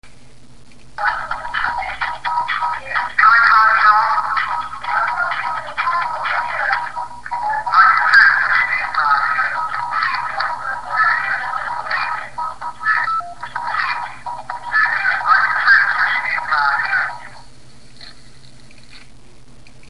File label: house